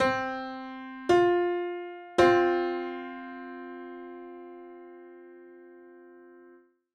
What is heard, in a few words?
Piano 7edo